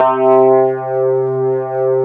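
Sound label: Wurlitzer C3, Keyboards Old School; USB Soundscan vol.09; AKAI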